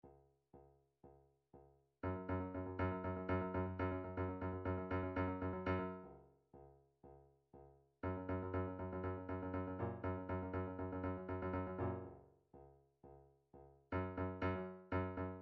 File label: drum trax hats